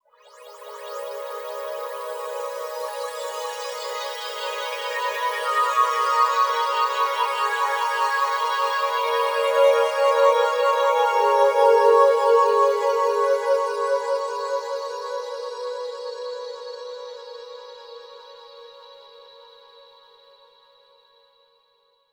SLOW LIGHT WAVE, SLOW SYNTHESIZER WAVES

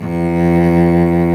solo, cello orch; Roland LCDP13 String Sections; STR Combos 1

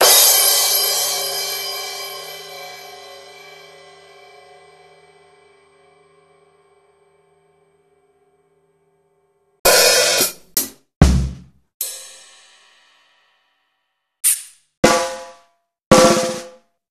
Clavia Nord Modular Kit

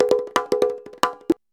CONGA 119

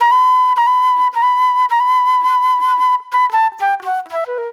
Agro Fusion Flute

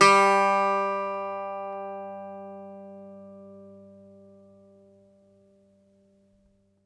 Greek Bazouki, plucked strings

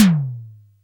Tom2, Clavia Micro Modular

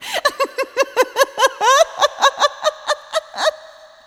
laugh

